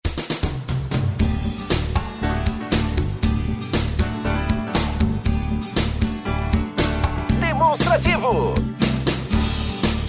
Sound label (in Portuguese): tema espera